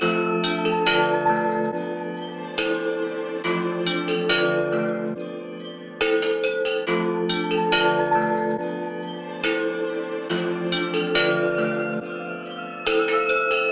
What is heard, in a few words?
140 BPM A Min, Trap Melodies; Castles; Cymatics; Trap Starter Pack